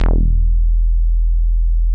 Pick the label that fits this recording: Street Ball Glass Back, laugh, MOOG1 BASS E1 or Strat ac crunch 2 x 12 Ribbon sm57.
MOOG1 BASS E1